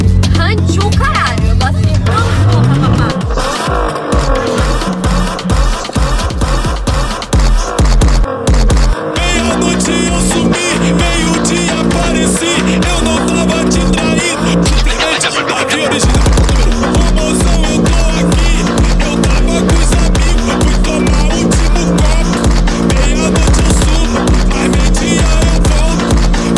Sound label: Montagem Phonk Brazilian